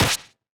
hit

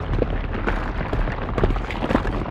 cave collapse

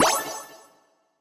petal get

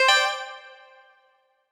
ui score Display

